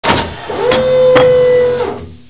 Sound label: rotating turret; golgotha